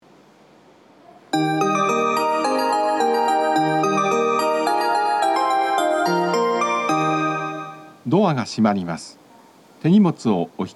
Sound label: ikebukuro melody